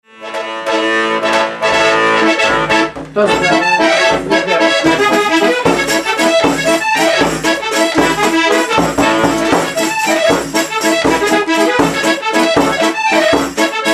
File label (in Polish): Oberek weselny